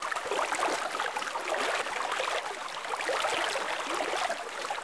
pool; ambience; Star Wars Jedi Knight II Jedi Outcast; XBOX

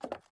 fs creakwood, footsteps

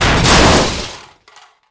desk

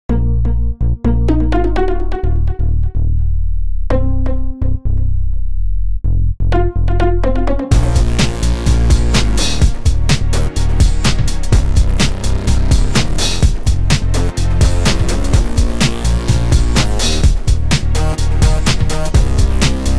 Pinball, Music; Pure Pinball

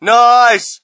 crowd, shout; Mike Tyson Heavyweight Boxing

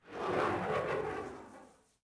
Passby, vehicles; Coruscant

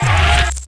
bullet zoom in; Tony Hawk's Project 8; XBOX

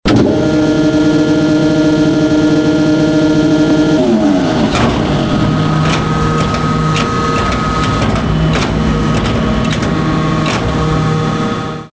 hydraulic; Soldier Of Fortune II Double Helix; XBOX

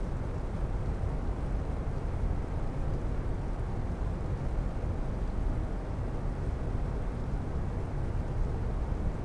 ambience inner Cat Entrance; Star Wars Obi Wan; XBOX

